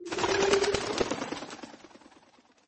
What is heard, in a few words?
birds